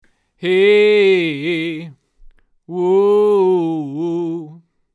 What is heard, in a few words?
Vox OC818 preamp only